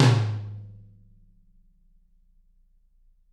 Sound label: TT, Toms; Bob Clearmountain Drums I